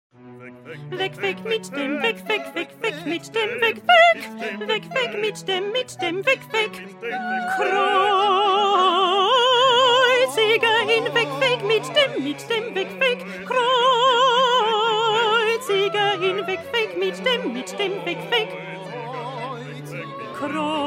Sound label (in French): PETIT CHOEUR ALTI